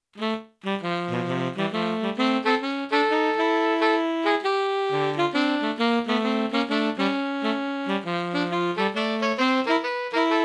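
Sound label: Tenor Sax, STANDARDS TENOR SAX DUETS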